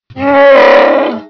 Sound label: wookie; cstrike